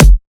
Kick, Dubstep; Dark Matter

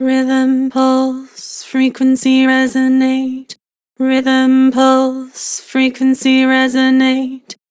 Vibrato Rhythm pulse frequency A, Harmor Vocals

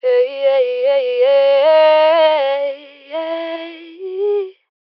Ad Lib Dry C# Min, Vocals; Cymatics - Eternity Sample Pack; Ad Libs

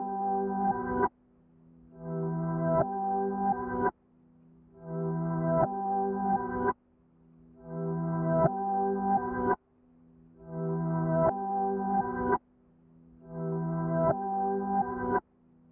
85 BPM E Min Piano; Loop Stems; Cymatics; 2022 Melody Collection Platinum Expansion; Vibes

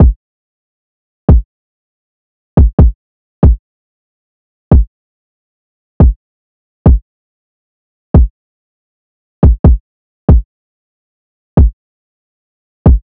Drum Loop 140 BPM Kick, Drill; Loop Stems; Brute

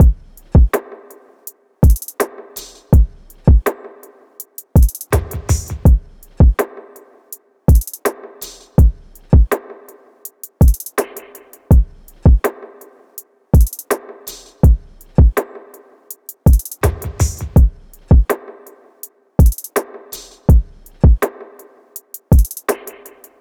Hallway Knock Drum Loop - 82 BPM; Cymatics; Mystery Pack Vol 4

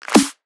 Mouse Snare D; Drums & Percs; Cymatics

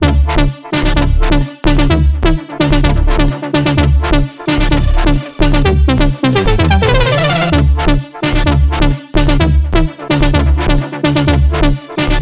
128 BPM D Min, House Drop Loops; Destiny; Cymatics

